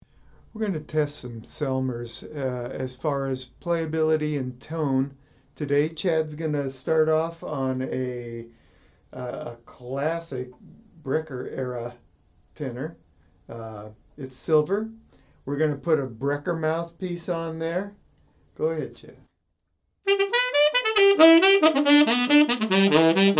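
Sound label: Tenor Selmer VI, tenor saxophone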